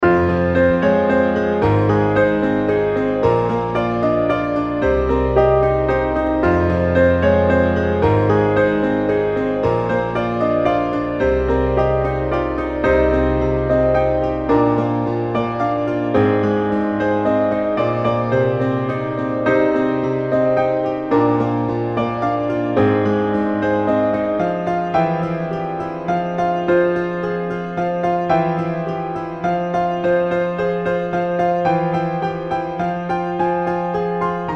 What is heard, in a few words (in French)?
piano fa mineur